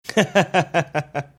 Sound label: chuckling